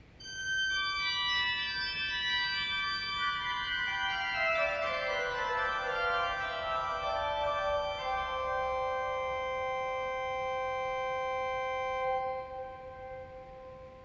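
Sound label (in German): scharff 3-4f 1, Chororgel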